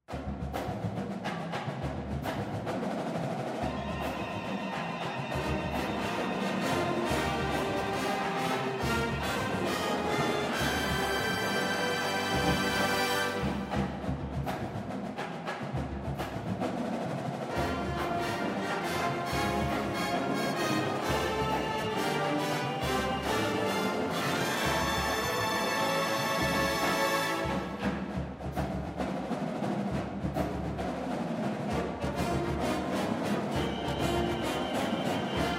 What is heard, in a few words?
Fanfare; Southern Miss